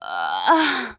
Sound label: Death General; Lara; Playable Tutorial Level